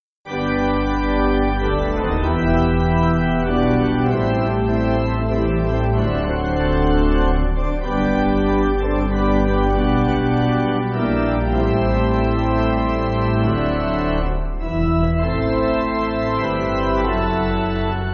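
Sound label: Pipe Organ, Music Backing; His Will Mine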